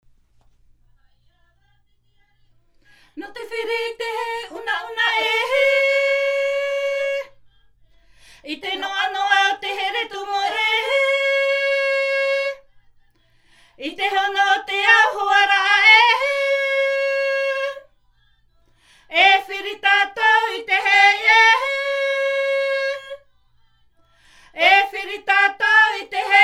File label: Himene tarava Tahiti; Tahape